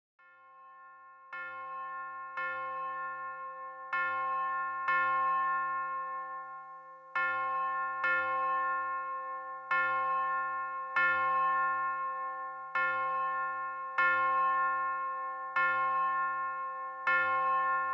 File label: ringing